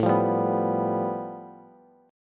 A 7th Suspended 2nd Sharp 5th, Bass, Bass Standard; A7sus2#5 strummed; JGuitar